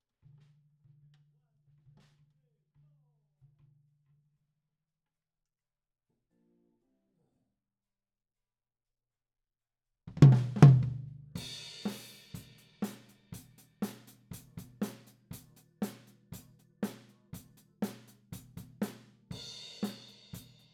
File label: TOM